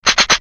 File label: dryfire; Defective Turret; sp sabotage factory defect